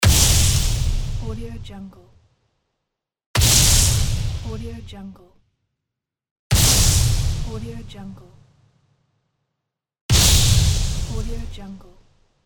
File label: explosion